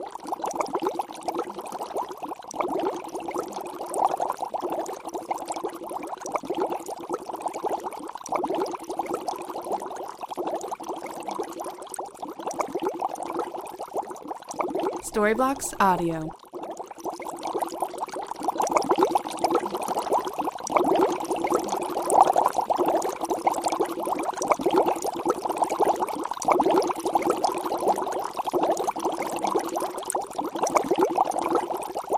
cauldron small boil water potion bubbles loop

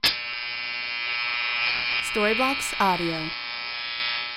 electric shaver

